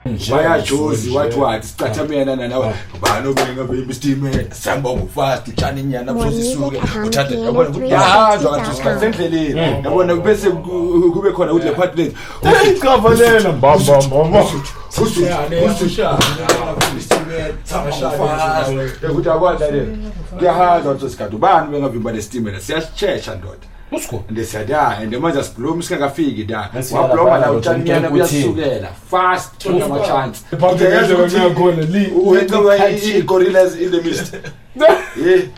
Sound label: kwaito